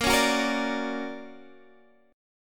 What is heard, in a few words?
D Augmented Major 7th Bb, guitar